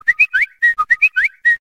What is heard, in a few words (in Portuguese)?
Whatsapp apito novo